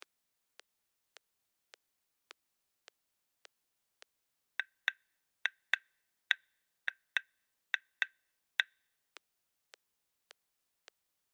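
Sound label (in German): Sofa 105bpm, Afrikanische Rhythmen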